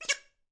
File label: quack